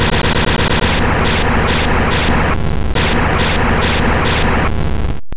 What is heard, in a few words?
Flame Wheel; sfx; attack moves; pokemon; gen 2; gsc